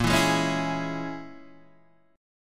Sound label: A sharp Minor 7th Flat 5th A sharp, Guitar DADGAD